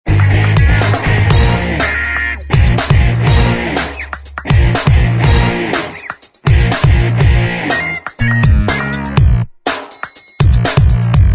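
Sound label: instrumental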